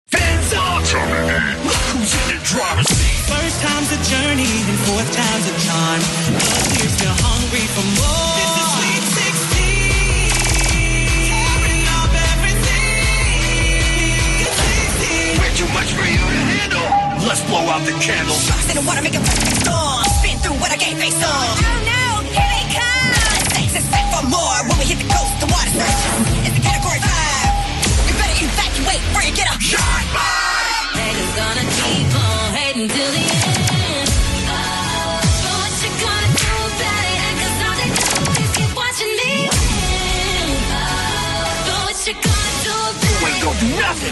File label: cheer mix; inter cheer